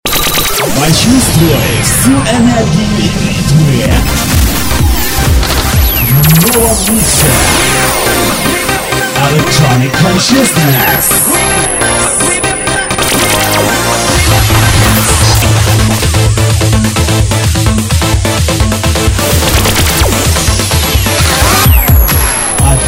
Джингл; 'Electronic Consciousness'

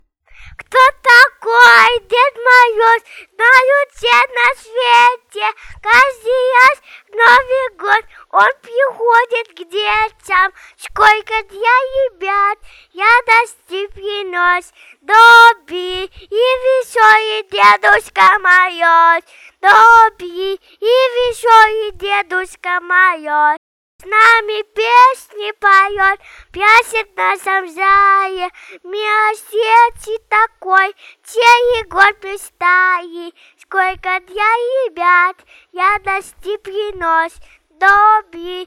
Детская новогодняя акапела

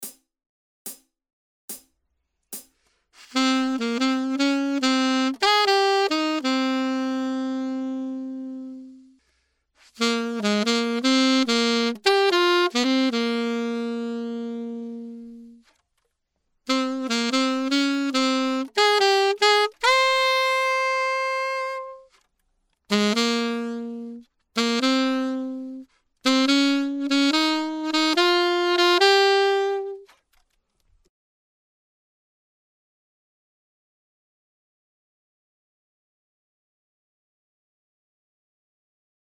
саксофон основное соло; Syntheticsax

